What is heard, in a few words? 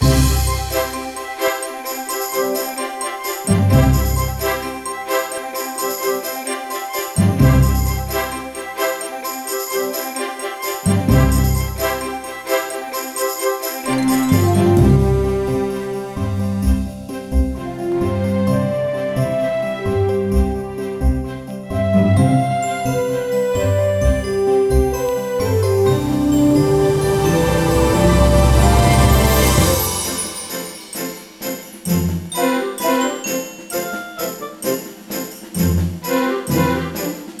forest chase; Barbie Horse Adventures Wild Horse Rescue; PS2